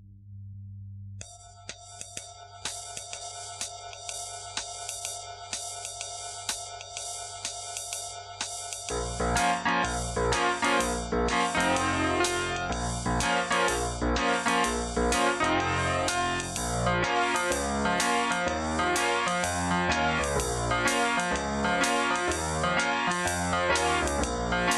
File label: Piano Solo